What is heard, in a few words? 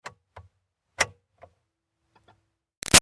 Car Highlander Ignition Key Turn; 2005 Toyota Highlander V6; Vehicles; Big Room Sound